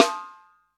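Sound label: snare, EMU Orbit-3 drum machine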